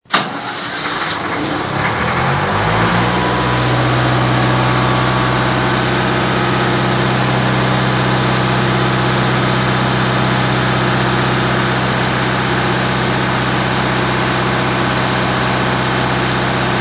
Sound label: Generator Tuned